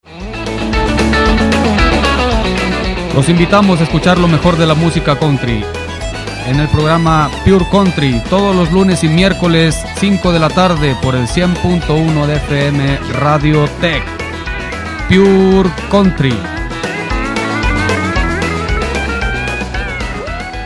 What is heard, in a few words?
pure country